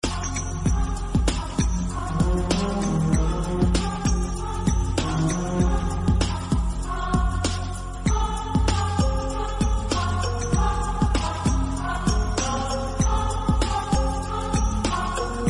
Music Ringtone; Vishwatma

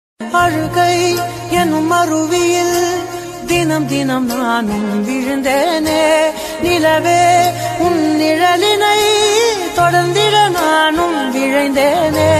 Tamil Song Ringtone

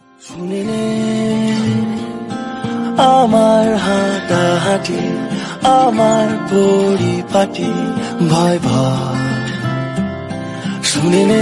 Bengali Ringtone

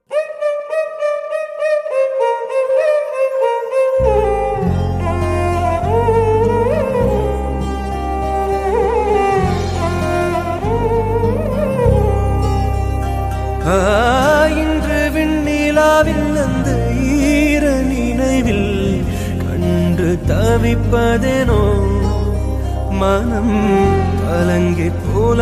Cute Tamil Song; ringtones

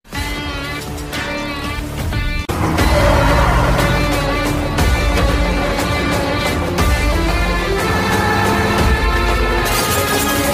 Theme Music; ringtones; Kaithi

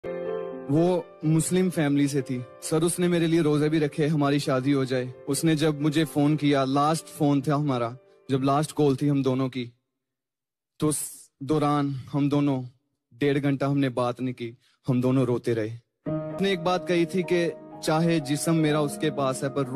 Sad Tik Tok WhatsApp Status Ringtone

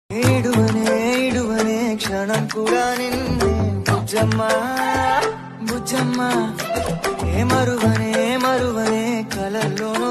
Telugu Ringtone

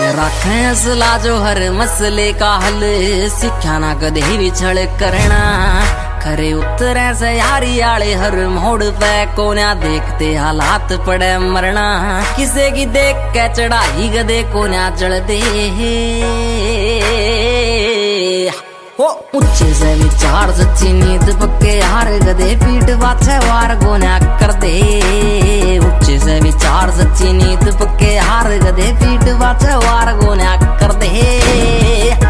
Indian Army Song Ringtone

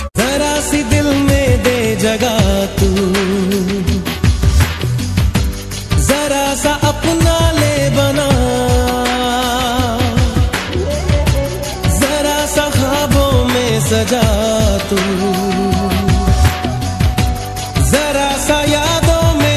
Romantic Hindi Song Ringtone

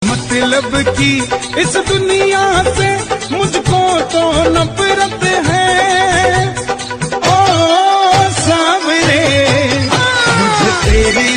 Bhajan Ringtone; Khatu Shyam

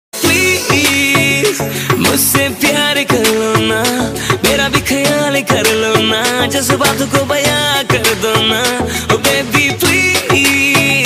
Romantic Ringtone

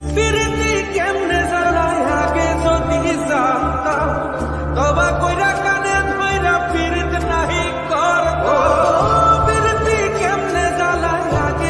Bengali Ringtone

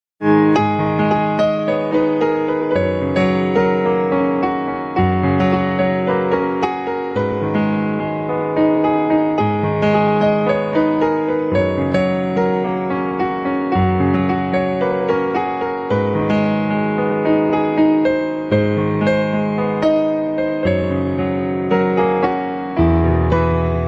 Instrumental

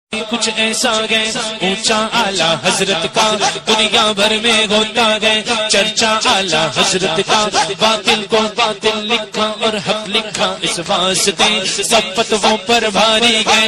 Naat Ringtone

